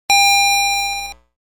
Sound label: Single Variable Gong